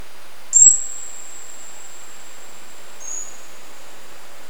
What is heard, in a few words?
sonar